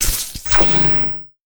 Start Forge; Orb Smith

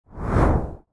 Turn Swipe; This Is the Police 2

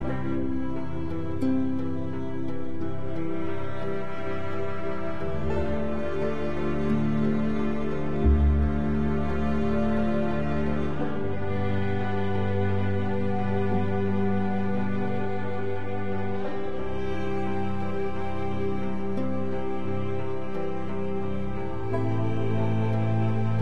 Neutral loop

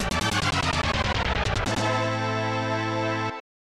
Unknown Jingle; astral bout 3 1995 snes

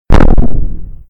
Cannon; Doodle Jump; Android; Arcade; Xbox 360

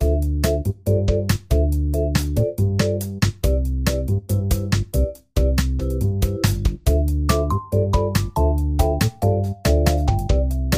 Tea Time Vibra

